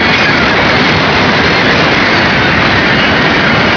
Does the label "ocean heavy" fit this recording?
Yes